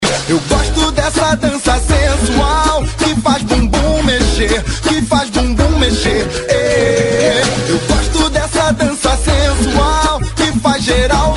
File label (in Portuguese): danca sensual; novela salve jorge